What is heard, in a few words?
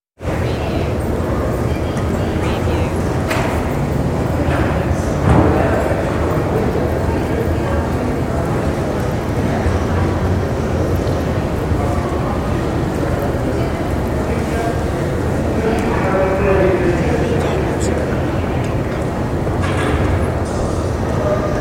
Hospital Lobby